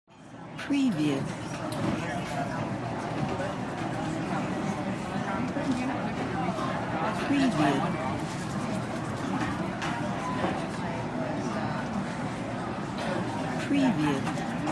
coffee shop ambiance

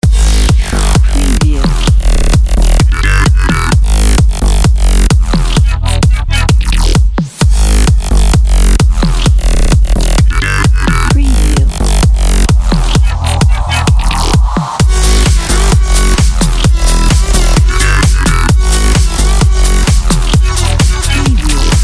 electro bouncy cool loop

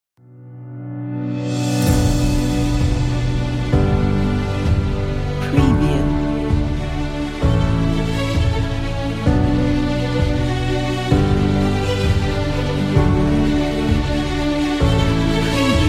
cinematic slideshow

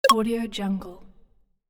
button lid click

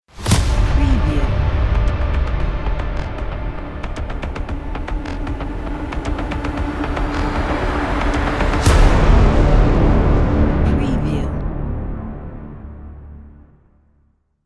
action epic logo

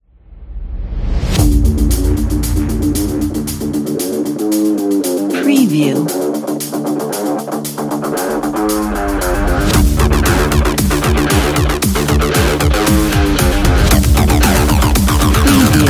hybrid action rock

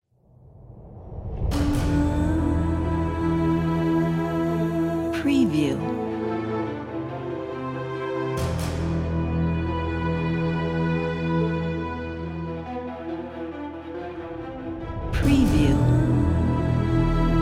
epic trailer

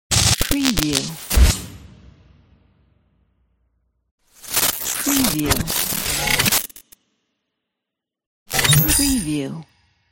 glitch opener intro logo

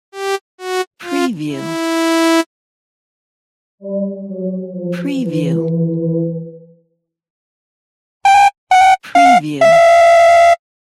wrong answer

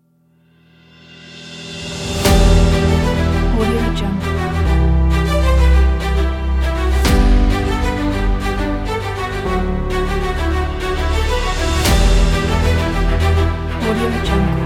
the epic

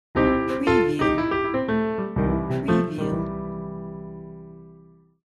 blues piano logo

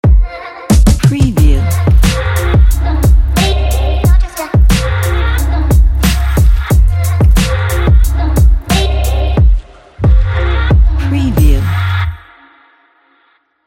background hip hop ident

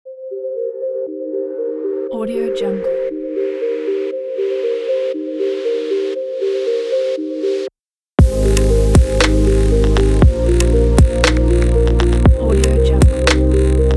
slow motion

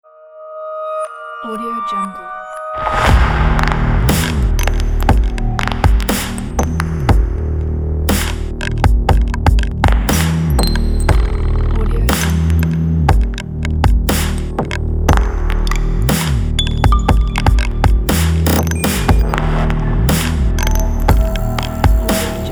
horror glitch trailer

